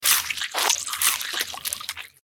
Zombie eat

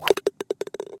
shot roll

